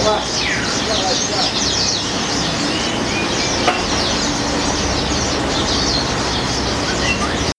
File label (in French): Le marché aux oiseaux